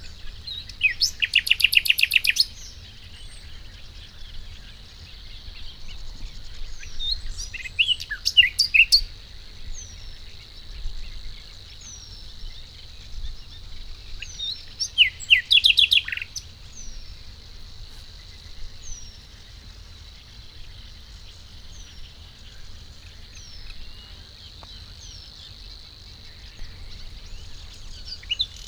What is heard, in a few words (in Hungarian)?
Hortobágyi kisvasút mentén enyhe szellő halastavak, madarak; allathangok; termeszetben